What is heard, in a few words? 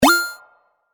Digital Signal Wapling; Digital Sound Effects; LYN094 package of Digital Clicks, Blips & Alerts